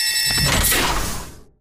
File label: atlas eject pilot foley and alarm; Titanfall 2